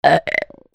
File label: CARTOON